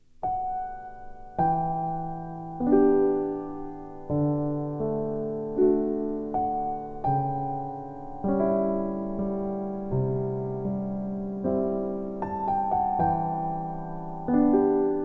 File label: Movie Piano Theme Em